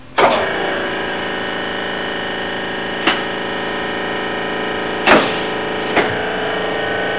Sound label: TRAM6